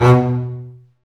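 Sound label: strings, contrabass marcato; String Master Series; Cb Bowed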